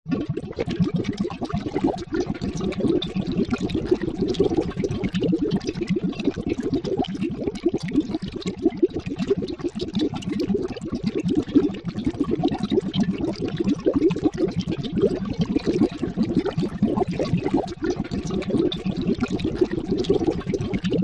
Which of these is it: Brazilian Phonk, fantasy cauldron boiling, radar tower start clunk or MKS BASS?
fantasy cauldron boiling